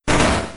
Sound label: shutter; P3D-Legacy